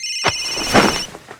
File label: phone throw; florent